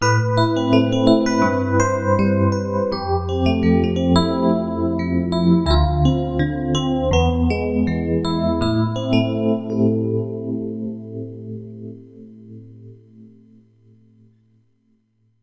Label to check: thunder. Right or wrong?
Wrong